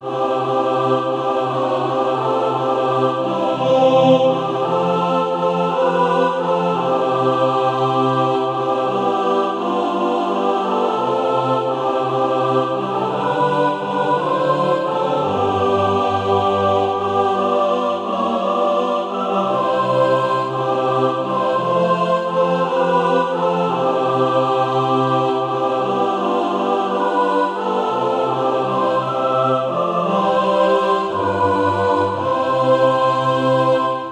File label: mixed